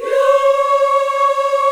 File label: C#5, CHOIR YAS, Africa; Spectrasonics; Heart of Africa 1